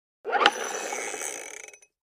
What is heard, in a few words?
Detonator Plunger